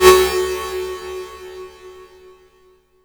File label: Tonal FX F#